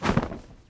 Whoosh Cloth

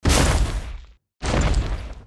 drop

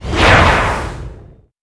Woosh

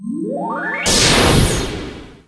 Turbidity Air

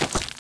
DROP Knee

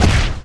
hit

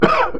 hit hydra

